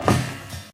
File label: metal grate, footsteps